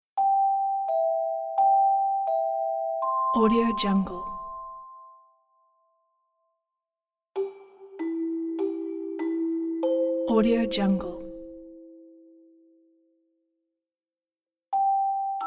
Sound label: christmas bell